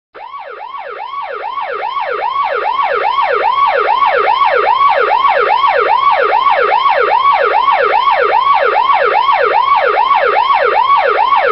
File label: Police Alert Ringtone; ringtones